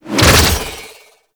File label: Archwing Prime Sword Short Whoosh; Warframe